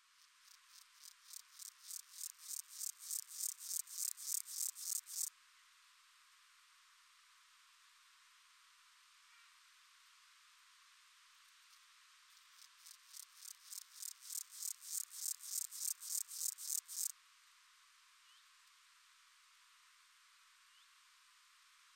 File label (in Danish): enggræshoppe, Pseudochorthippus parallelus; I køligt og skyet vejr og om natten; Insekter